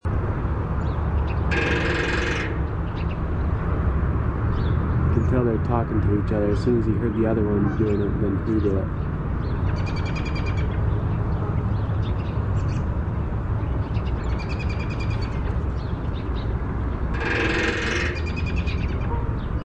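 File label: Northern Flicker drumming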